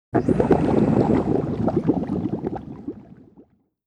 player scuba bubbles stereo

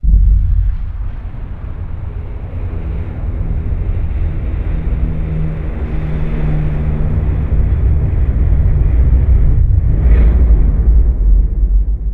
building collapse

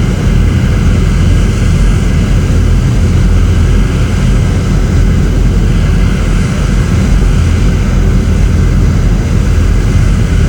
Aircrafts Engines Mig15 Back Engine; DCS

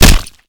Projectile Sounds; Red Orchestra Ostfront 41-45

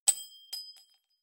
shells 20mm bolt; tanks; War Thunder

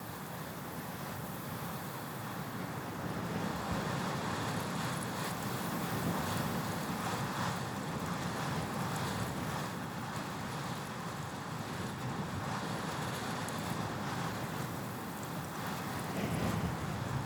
ambient desert; War Thunder

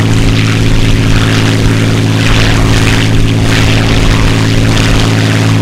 mustang rear, engines; War Thunder

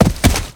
bodyfall water; Call of Duty Modern Warfare

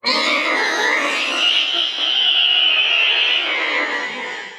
ship engines; World Of Warships

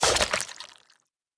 flesh; Rust

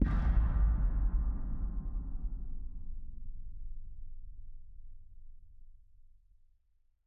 music interactive; World of Tanks

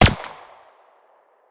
mp5 attack silenced; Rust

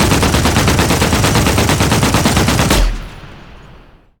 plane; World of Tanks

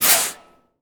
Pneumatic Brakes On, Aircrafts; DCS

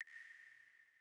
water drip light; Rust

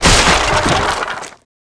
toilet; World of Tanks Blitz

